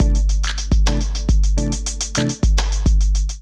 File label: Kit Melodic 140 bpm, Drums; MIDI Clips; Allee Gate; Ableton; Chop and Swing